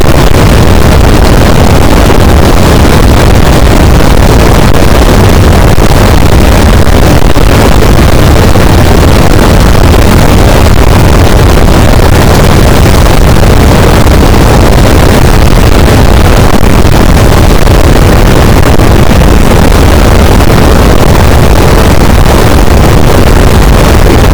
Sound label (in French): cachalot positif, boat; Physeter Macrocephalus